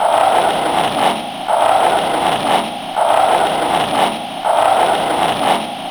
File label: Womb sound